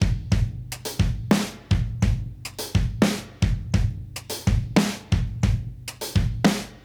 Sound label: Modern Breaks; Loops; Touch Loops; ClassicBreakSessions